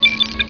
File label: Bleep